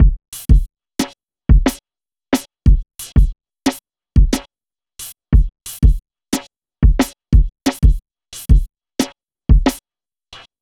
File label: jungley 090bpm, Downbeat Dance Grooves; Zero-G